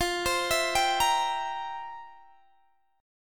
F Major 7th Suspended 2nd Suspended 4th, Guitar, DADGAD; x,20,22,21,22,20; down; chord shape